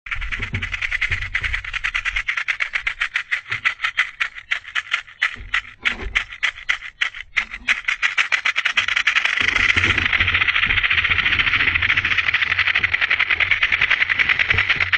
rattlesnake; مار زنگی

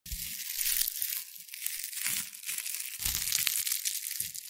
sponge

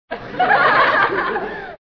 Laughing all together Girl

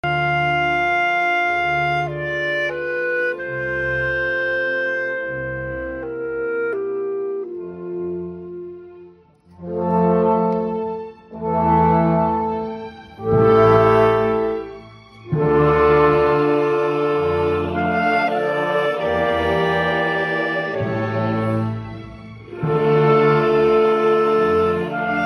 Basset horn; کرباست

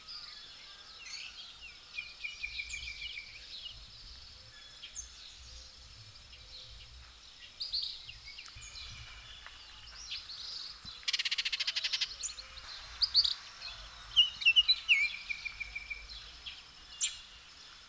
Northern Oriole; پرنده اوریول شمالی

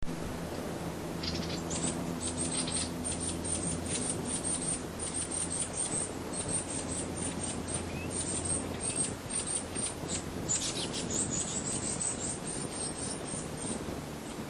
Peruvian wren; پرنده الیکایی پرو

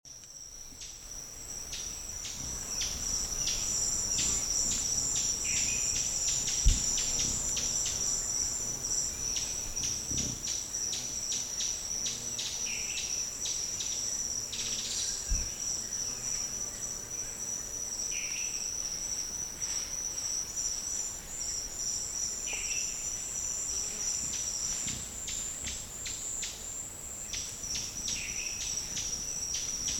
Fiery-topaz, پرنده زبرجدی آتشین; صدای پرنده زبرجدی آتشین

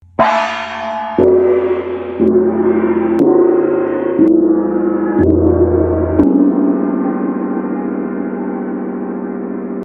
Tam Tam

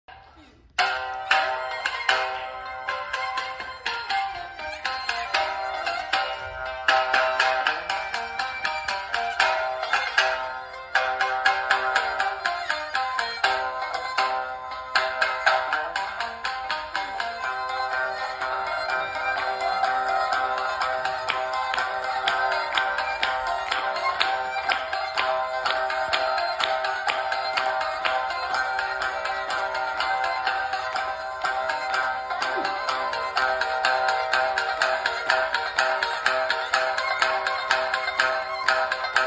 kokyū

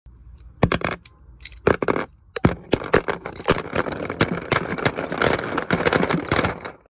Dropping pencil; افتادن; افتادن مداد

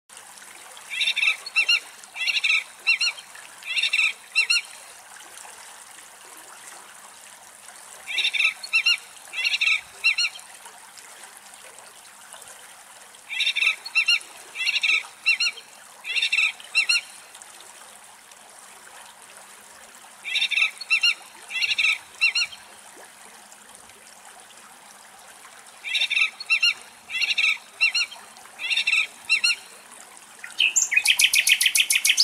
Collared pratincole

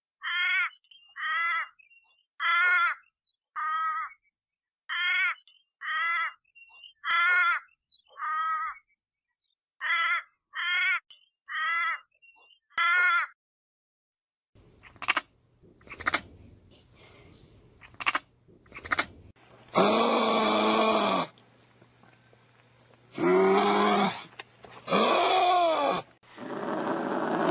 Wallaby; والابی

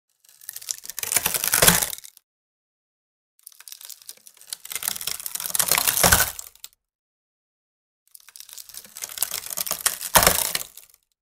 breaking wood; شکستن چوب

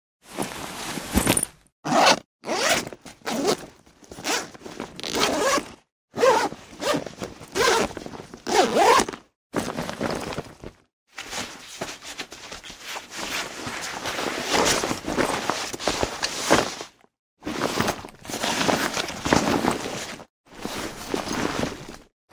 backpack

